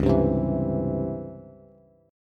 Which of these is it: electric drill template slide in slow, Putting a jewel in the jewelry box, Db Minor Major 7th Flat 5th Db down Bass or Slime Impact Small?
Db Minor Major 7th Flat 5th Db down Bass